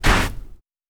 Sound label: Sand gravel